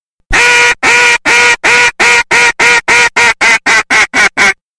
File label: Duck Call Lonesome Hen Sounds Car Horn